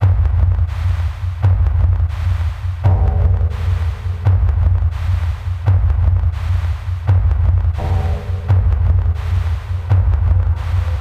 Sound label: suspense; DayZ-Epoch; dayz sfx